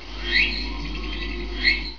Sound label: cicada